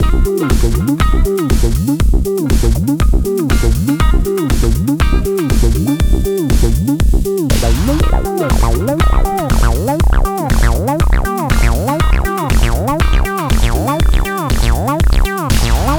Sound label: Romanian Touch with drums